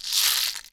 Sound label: box rattle; Kenya Box Rattle